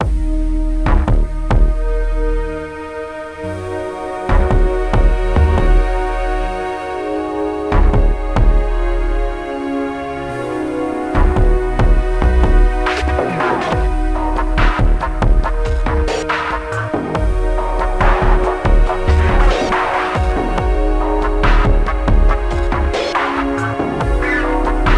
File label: Spooky Rapid Repitched; Game Music